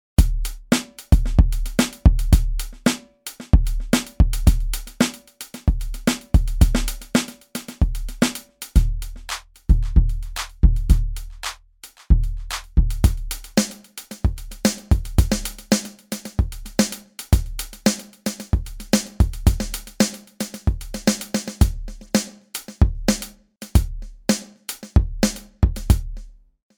Premium Electro; live instruments

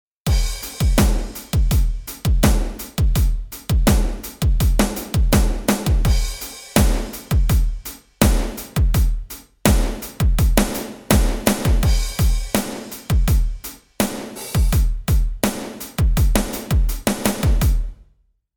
Premium Rock; live instruments

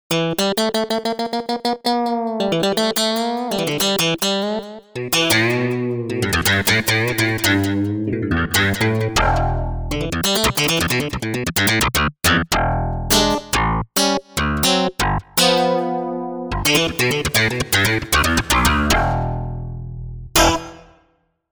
FM Slap Bass; Montage; basses

